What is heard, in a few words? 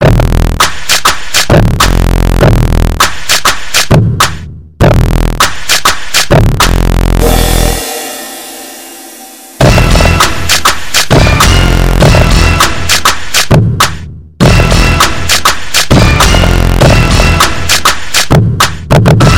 crack bass